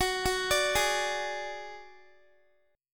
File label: Gb Suspended 2nd Sharp 5th, Guitar; arpeggiated